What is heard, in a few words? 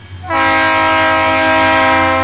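TRAIN WHISTLE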